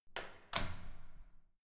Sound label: security door close soft